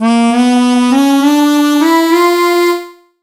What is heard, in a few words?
harmonica; eldoon